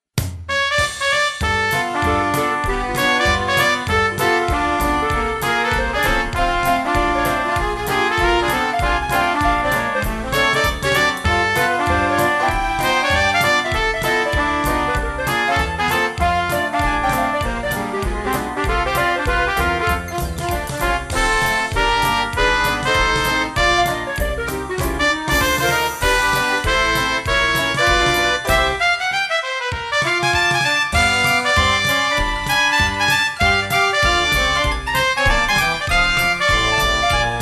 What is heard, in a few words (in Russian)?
djazovaya improvizatsiya